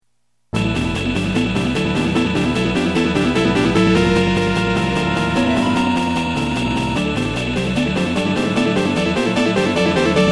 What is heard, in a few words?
fight metal; 1995 knuckles chaotix